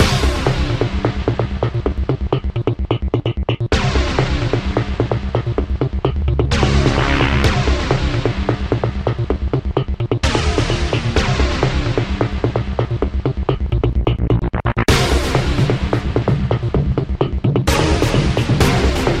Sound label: Alleycat Blues; Verdict Guilty